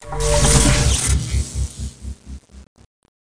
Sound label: new SnapBack; Macromedia